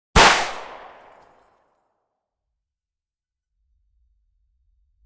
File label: Gunshot pistol